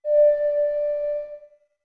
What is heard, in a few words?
Ocarina D long; OOT